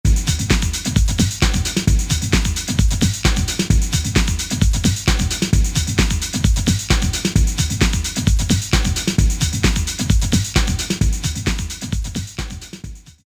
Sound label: So Fly Gruve; Alesis Rap Techno Dance